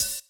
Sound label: clear high hat c sharp key; hits one shots; hat samples; Duration 0:01; Samplerate 44100 Hz; Bitdepth 24